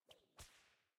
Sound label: Attack Sword